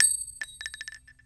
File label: shell, weapons; valve